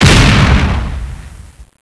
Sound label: explode